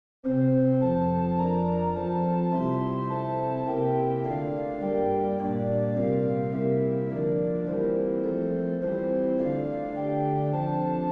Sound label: The Day Thou Gavest Lord Is Ended St Clement Organ 5 Verses; Hymnal 1982